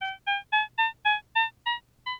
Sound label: Drug Caution, alarms